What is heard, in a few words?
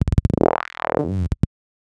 Bass drone F, Circuitbend; Drones - Bass; 99Sounds; Mangling Audio